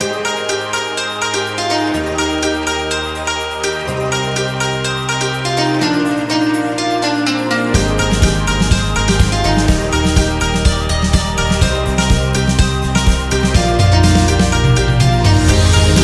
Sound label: New Chinese Year; sounds of the world